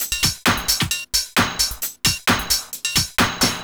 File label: No Kick Loop, Dance Beats